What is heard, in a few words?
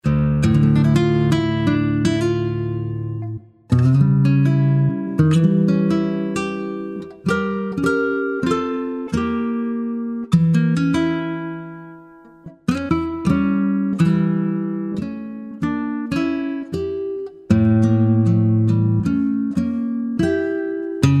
spanish acoustic guitar